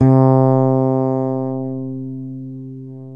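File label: 8 string fretless electric bass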